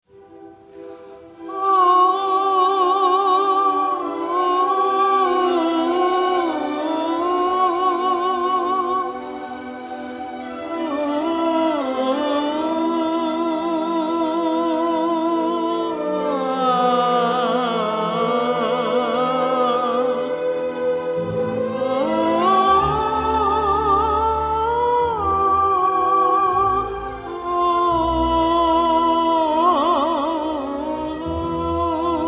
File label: vocalise